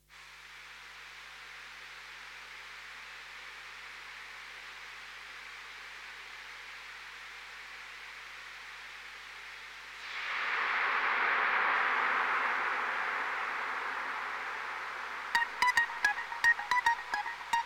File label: dreamy post trance dark synthwave; ambient hardware jam; 2600; kobol expander; neutron; pro 1; rd 9; double rainbow